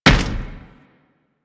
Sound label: Step Default, Footsteps; Warframe